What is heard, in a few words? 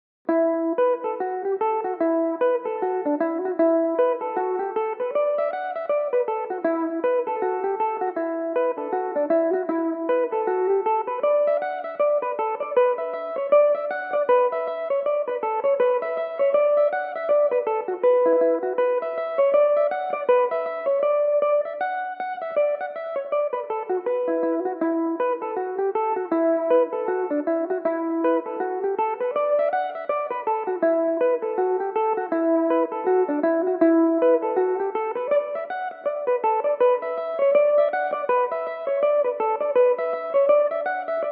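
E dorian, Irish mandolin; (4/4); Belmuse EM-190 electric mandolin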